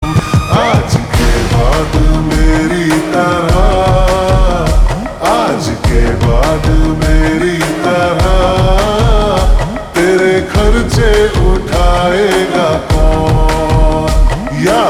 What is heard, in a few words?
Ringtone Slowed Reverb